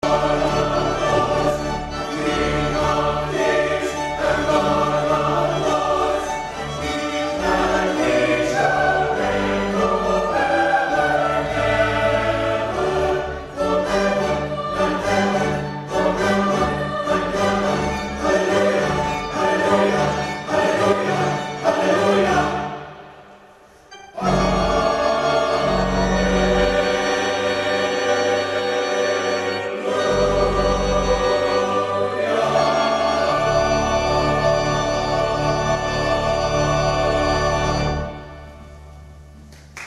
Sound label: Hallelujah Chorus